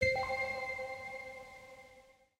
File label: spin music click; spinsane